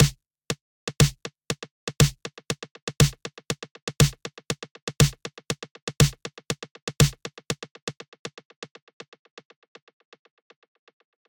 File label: Snare, Ghost Notes, feedback echo; Newfangled Audio